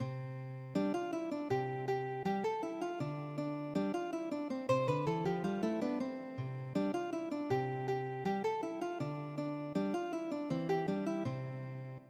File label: cancan, guitar